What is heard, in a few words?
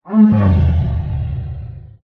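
bear die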